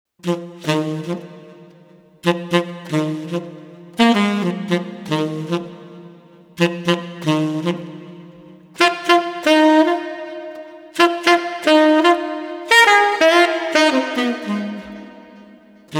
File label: Street Sax Investigative